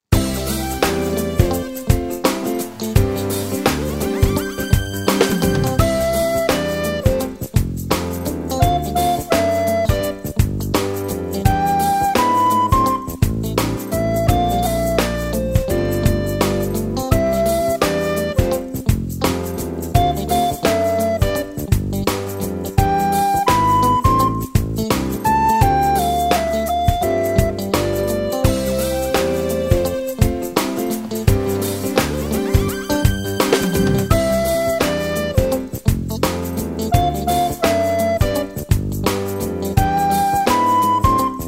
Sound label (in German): blockflötentaxi